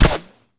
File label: sniper rifle mod